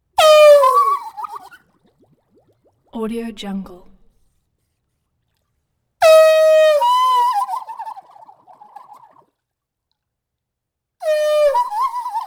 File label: air horn on can compressed various bursts; prosoundfx